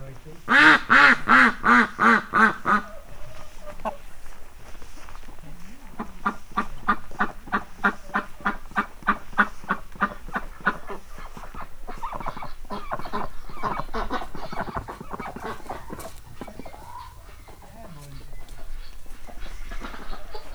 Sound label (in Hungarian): házikacsa; állathangok; paraszt udvar